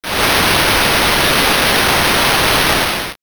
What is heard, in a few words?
rain